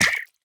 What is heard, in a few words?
hurt, pufferfish; minecraft 25w18a; mob